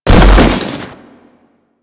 Bodyfall; Warframe; Tenno; Foley

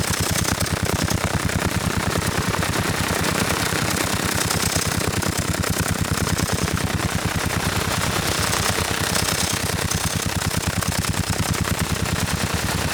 Worker Hover Ship Idle Loop; Grineer; Vehicles

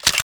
Hand Cannon Remove Clip, Reload; Warframe; Weapons

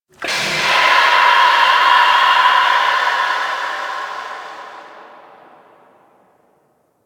Terraform Machine Gas Burst Distant B; Asteriod Transition

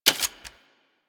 Sundial SMG Reload, weapons; Warframe; Tenno

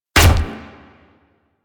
Full Draw Shot, Weapons; Warframe; Infested; Inf Cernos

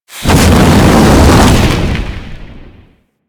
Space Fire Debris; Ambience; Space Battles; Warframe

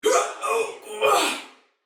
Death, Female, Soldier; Dialog; Barks; Battle; Sinecure

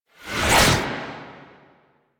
Impiety Riser; Warframe; Warframes; Dagath

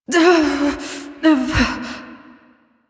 Fight Breath; Cinematics; Warframe; The New War; Throne Room

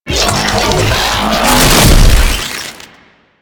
Spin Flesh; Enemies; Abilities; Warframe; Proto Infested Ancient

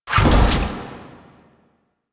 Execute Teleport; Warframe; Warframes; Pax Duviricus

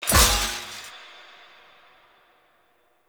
Passive Armour Shatter; Powers; Bromius

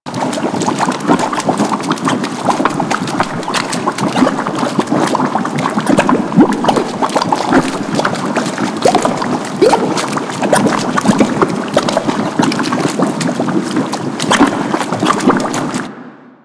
Shipyards Goo Small Loop

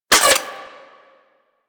Scope Gun Mech; Crp Scope Gun

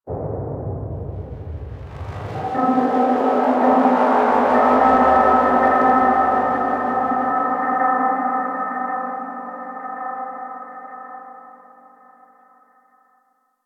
Exterior Deep Abyss Hit, Ambience; Warframe; Railjack

